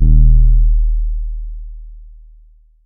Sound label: Trap bass, EDM; 7rystan's 600+ FREE EDM SAMPLES & DRUMS